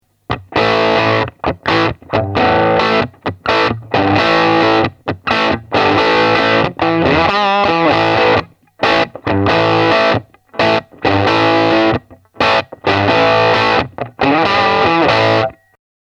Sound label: Crunch